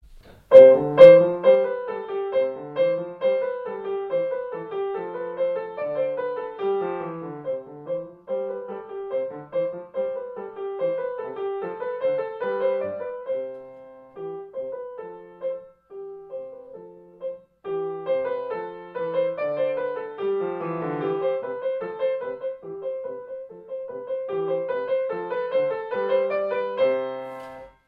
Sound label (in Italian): Gavotta